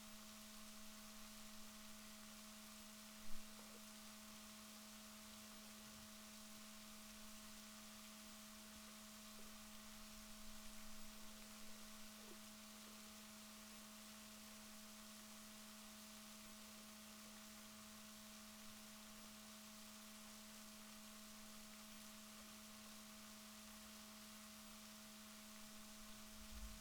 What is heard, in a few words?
rorquals negatif, Balaenoptera Physalus Chile